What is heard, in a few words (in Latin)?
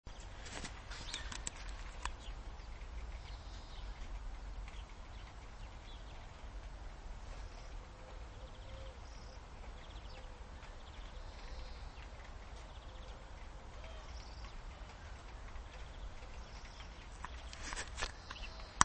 Chloris chloris; SETE